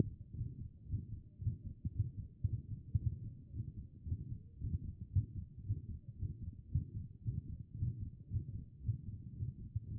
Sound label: HEART; B MODE